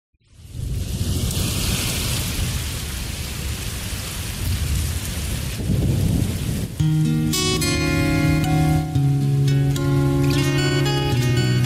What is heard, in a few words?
Vocal Removed HD Karaoke Sample Track